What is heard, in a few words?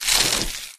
human form short move; flood